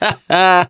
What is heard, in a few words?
laughter, mechanic, survivor